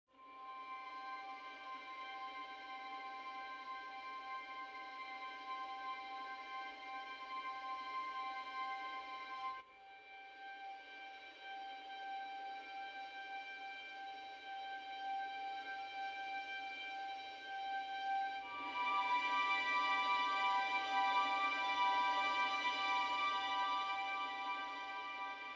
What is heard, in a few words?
Violin 2 Harmonics Sus